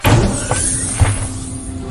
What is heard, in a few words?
door open; svencoop; rngremake